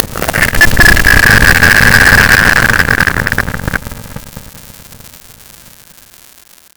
Day Screechy Creature; Ambience; One Offs; Glades Of Andurin

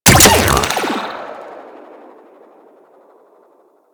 Shock Rifle Alt Shot, weapons; Warframe; Corpus

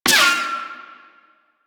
Sniper Ricochet; DE; Warframe; Frumentarius; Exalted Sniper

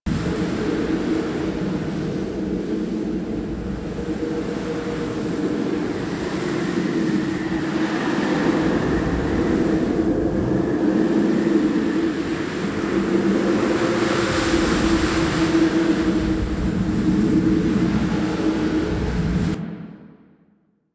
Settlement Int Tunnel Amb

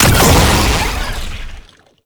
Spore Pistol Last Shot; Weapons

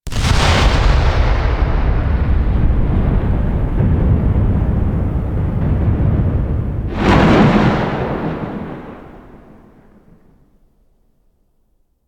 Void Storm Explosion; Warframe; Quests; TheNewWar; Ambience; Zariman

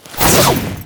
Ninja Stealth Hit React, Foley; Tau Dax

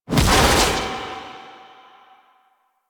Queens Chamber Queen Blast; Ambience; Warframe; The War Within

